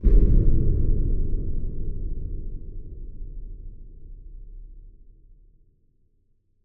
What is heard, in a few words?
Impact Boom Rumble; bottlerocket